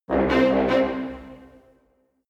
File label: jingle brass and strings off beat